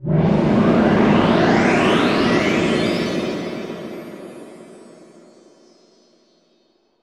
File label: AMBNT 160; Ambient